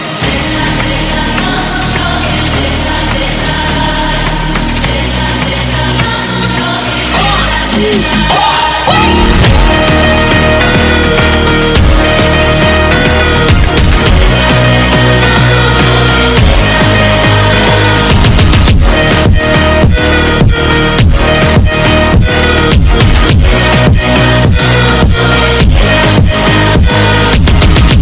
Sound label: funk